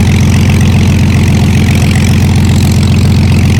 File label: zlin side, engines; War Thunder